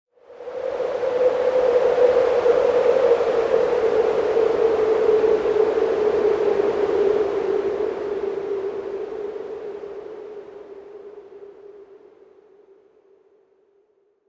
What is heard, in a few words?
wind howl, ambiences